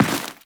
Run Dirt, Footsteps; Warframe; Teshin

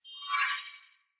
Sword Whoosh Accent Heavy; Weapons; Player; Swords; Fey Sword

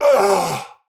Death, Battle; en; Tau; Tau Dax Knight

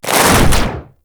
Ice Swing; Grineer Hammer Boss

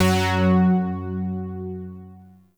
Shawzin Oct One F Sharp; Music; Warframe; Zariman

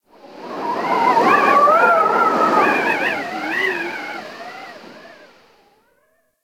Ice Cold Wind Whistle; Warframe; Corpus Ice Planet

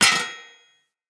Long Sword Impact Block Defender

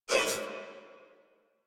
Pain Crewmen, Battle Barks; Dialog; Warframe; Corpus Crewmen; Crewman C

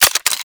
Revolver Pistol Reload Eject

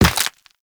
Run Bone, Footsteps; Warframe; Operator

